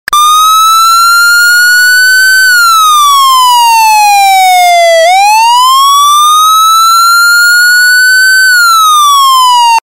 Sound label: Wail